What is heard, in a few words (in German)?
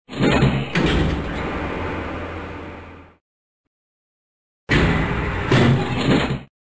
Lift normal; The Dream Machine